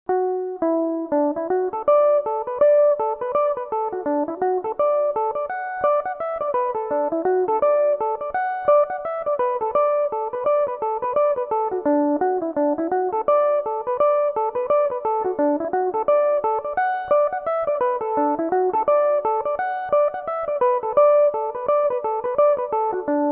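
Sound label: conniption d major, mandolin; The Conniption; Belmuse EM-190 electric mandolin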